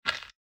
footfall gravel step; soundscrate

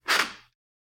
tape off; soundscrate

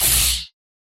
lightsword turning on; soundscrate

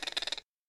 exotic bird sounds; soundscrate

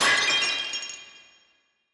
dropped glass; SoundsCrate

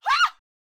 woman fear scream; soundscrate

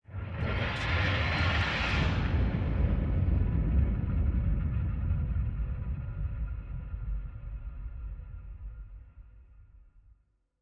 Hydraulic Lifter; soundscrate

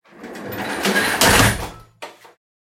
Iron Door Close; soundscrate

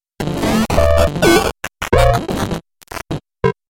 game glitch

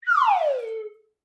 Slide Whistle Down; SoundsCrate

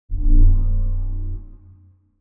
large space ship short rumble; soundscrate

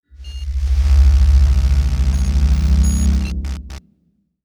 SciFi PowerUp; SoundsCrate

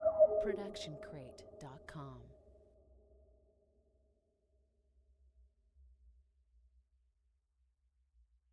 soft pin drop scifi ambient; soundscrate

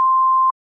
censor beep short

